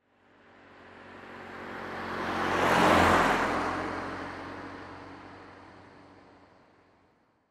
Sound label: Saturn Sl2 1996 Drive By Left To Right 25 Mph; Transportation Automobile; Car